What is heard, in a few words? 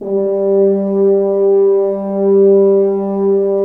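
french horn, brass; Roland - LA Composer vol. 1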